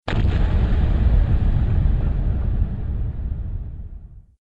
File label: bomb; Bottle Blast